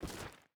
footstep rubber boots stones walk; Rust

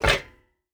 footstep hide metal jump land; Rust

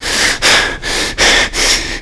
player climb breaths; Call of Duty Modern Warfare

